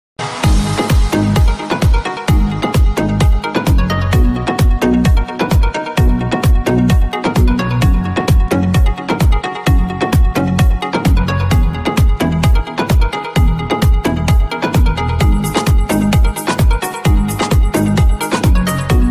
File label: lovely house ringtone